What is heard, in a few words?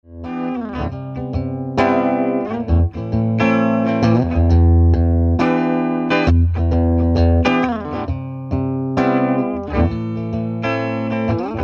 ibanez